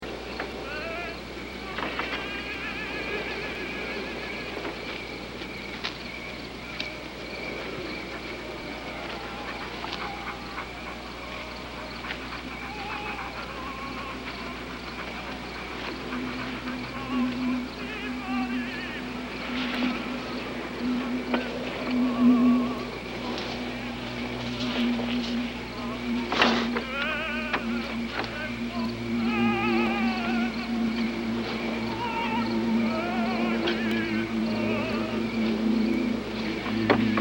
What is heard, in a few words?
Victory